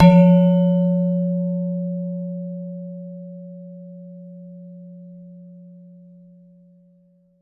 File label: Gender, Gamelan